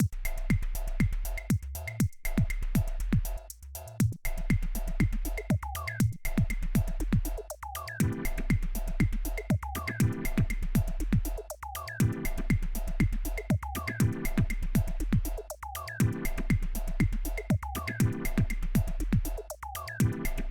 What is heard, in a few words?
deepsea metro subway car